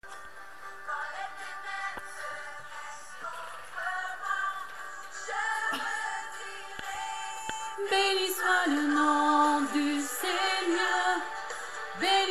Voix alto

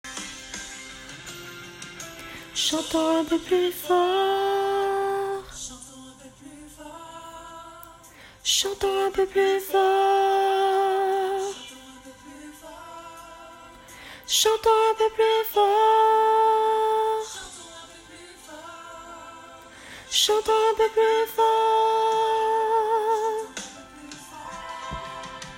voix haute; pont

